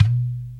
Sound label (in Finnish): Turkkilasirumpu Boing